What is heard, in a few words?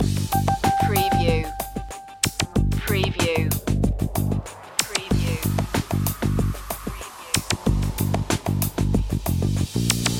incoming transmission loop